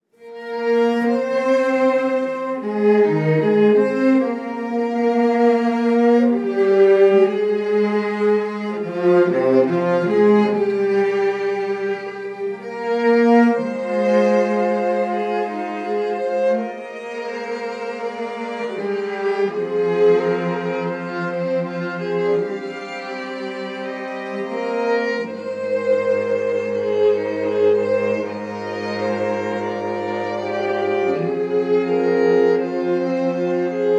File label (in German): Streichquartett Ausschnitt; Offenburg